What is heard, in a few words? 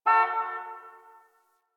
Distant Car Horn